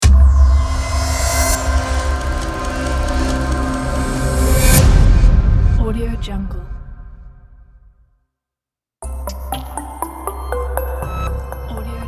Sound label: logo intro big pack